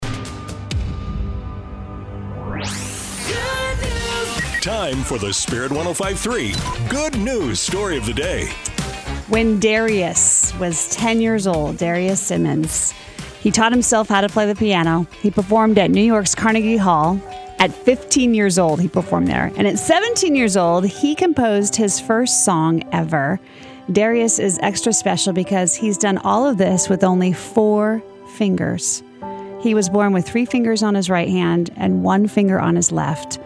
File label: piano